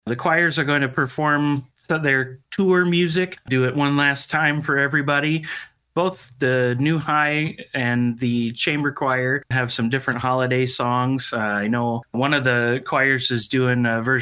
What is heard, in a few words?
Choir; new hampton high school winter music festival